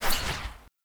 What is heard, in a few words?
Foley Action Dodge Perfect; Characters; Player